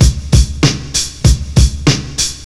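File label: drums, Pure Electro; USB Soundscan vol.03; AKAI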